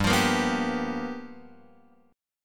G 7th Sharp 9th G chord; G7#9 Chord {3 X 3 3 0 3} strummed

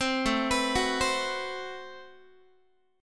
A Minor 6th Add 9th A Guitar; 15,17,14,16,16,x; arpeggiated; Am6add9

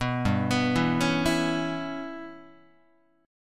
G 6th Flat 5th G, Guitar; G6b5 arpeggiated; JGuitar

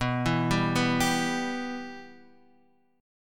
B Suspended 4th Sharp 5th B Guitar Drop D

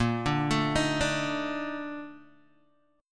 Bb Major 11th, Guitar, DADGAD; 8,5,7,8,5,x

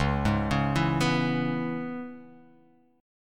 C sharp Minor 7th C sharp, chord

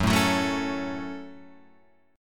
F sharp Minor F sharp, Guitar Open F; strummed; JGuitar

